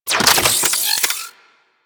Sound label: Briefcase Akimbo Pistol Reload; Warframe; Weapons; Corpus